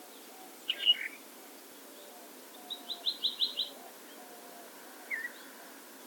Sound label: Phainopepla nitens; ecologia paisaje sonoro